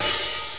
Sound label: hihat open